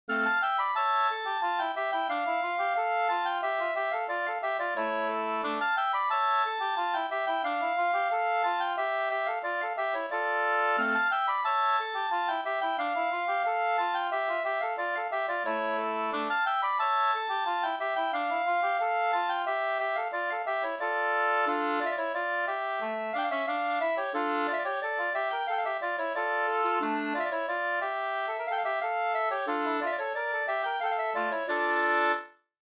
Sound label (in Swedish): halling; fr Eksharad SvL Vr2 2st